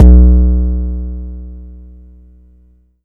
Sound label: Long BD, Jomox Xbase-09; Drums